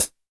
HiHat, Roland R-70

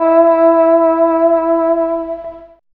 HORN; East West - Phat & Phunky

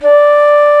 Flute D4; Proteus 2000; Digital Sound Factory Proteus 2000

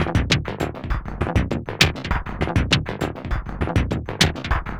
perc scramble, Percussive Loops, 100 bpm; Sample Magic - Transmission-X

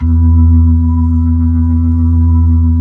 B-3, Blues, Keys of the 60s and 70s

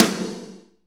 snare, Power Ballad; Northstar; Drumscapes Roland

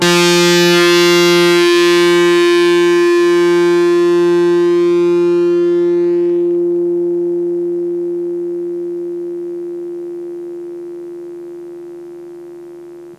Blues Pick F3, Blues Guitar

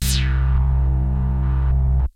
BUZZ, MOOG; Rubber Chicken Software Sample Bank

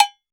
Cowbell Mute; Roland BK-5; Percussion

